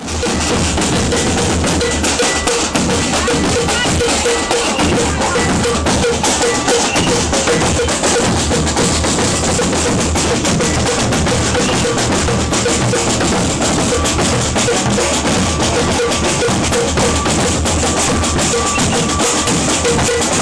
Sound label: maracatu warm up